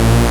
Synths A#; Vengeance Samples

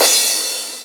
Cymbals Crash; Vengeance Samples

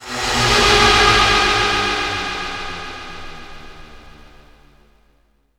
VFX Atmos

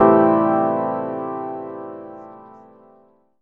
Synth Shots; Vengeance Samples

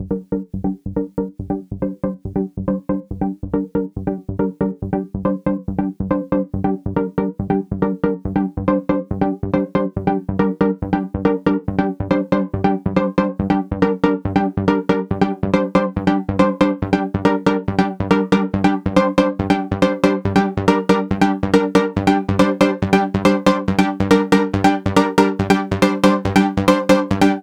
Pluck Intro DRY 140BPM, Gminor; Unlooped; Vengeance Samples; VTS1 Devastating Truth Kit

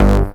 Synth Bass; Vengeance Samples; VEE Synth Shots

